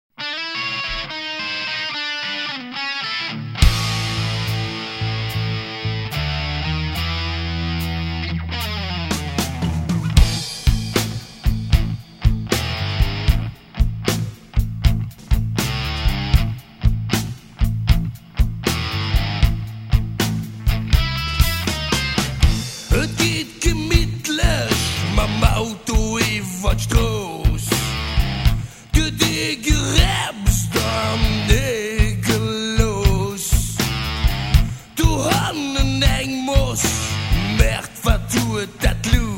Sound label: blues